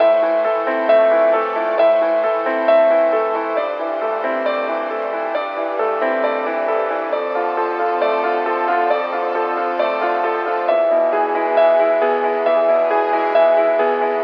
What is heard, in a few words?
piano, Loops, Sad